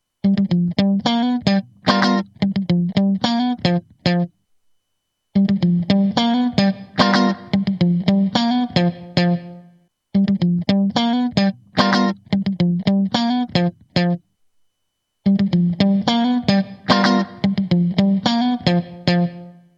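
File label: Funky Riff, guitar; Nobels REV mini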